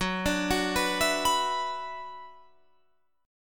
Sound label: Gb 7th Suspended 4th Sharp 5th Gb Guitar Open F, Gb7sus4#5 Chord; Gb7sus4#5 arpeggiated